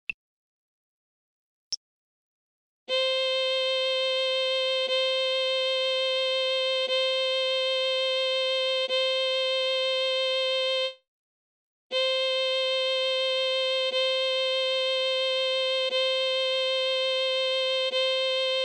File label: c violin